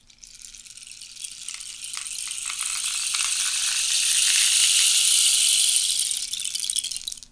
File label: RAINSTIK; PlatinumPhattCD2; E-MU Producer Series Vol. 8 – Platinum Phatt; Flexis Etc